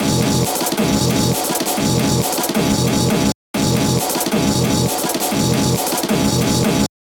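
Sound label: drum beat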